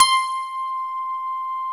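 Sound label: grand piano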